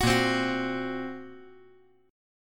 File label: Bb Suspended 2nd Sharp 5th; Bbsus2#5 chord; strummed; 11,10,8,9; JGuitar